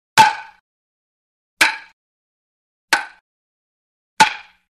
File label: Bat Hits Single Light Hit With Bat, Reverberant; Hollywood Edge Foley Sound Library